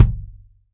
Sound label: RBD MF, ROCK KICK